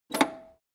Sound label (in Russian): phone